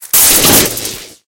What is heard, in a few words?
respin transform